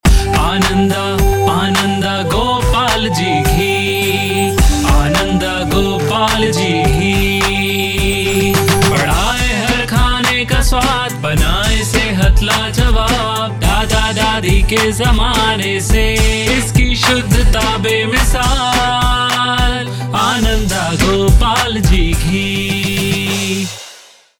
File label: ghee jingle; ananda